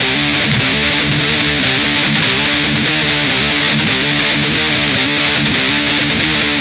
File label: guitar; LiLA'c Records PsyTrance Sounds 01